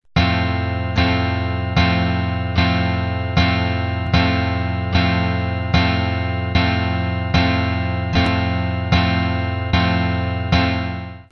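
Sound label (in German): f dur akkord, F major chord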